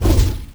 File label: fire attack; Crypt of the NecroDancer